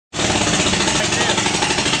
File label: Blown Plug